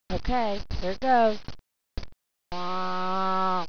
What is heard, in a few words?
moo